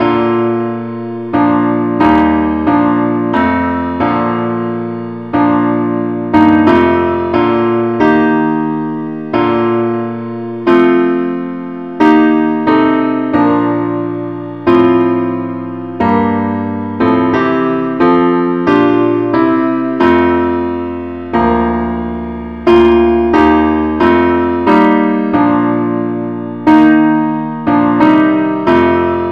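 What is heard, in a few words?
parts only, key B flat; christe Sanctorum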